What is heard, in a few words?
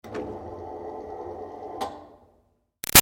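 Projector Screen Medium Far Down Short; Offices; BigRoomSound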